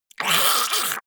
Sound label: monster choking high; comedy-cartoon; soundpool